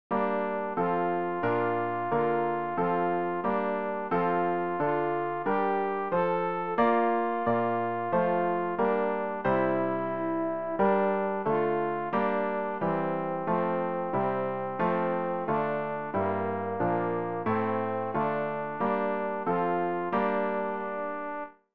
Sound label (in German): alt, Übehilfen für das Erlernen von Liedern; ref gesangbuch; rg 215 herr wir warten arm und hungrig